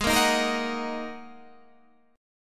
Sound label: G sharp 7th Suspended 2nd G sharp Guitar Standard; G#7sus2 strummed; 16,13,13,15,16,14; JGuitar